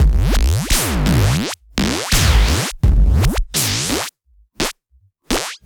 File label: Structured Rhythm, IDM Rhythms